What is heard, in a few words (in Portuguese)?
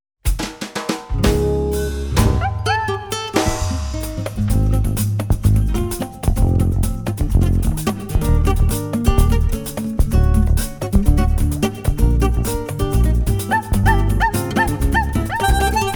samba fino